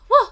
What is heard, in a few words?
ground pound wah; peach; sm64coopdx; char-select-extra-chars